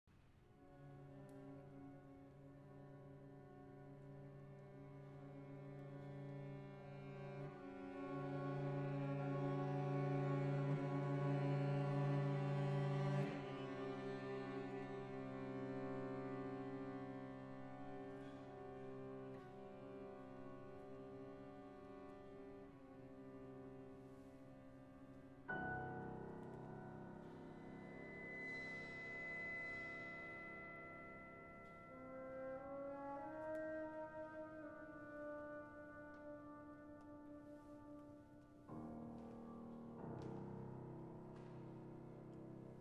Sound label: Ocean